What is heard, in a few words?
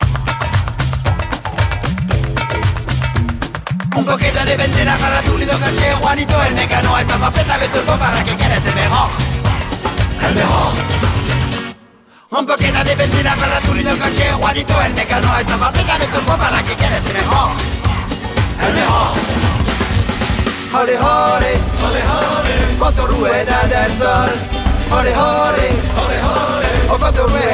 Bar Espagnol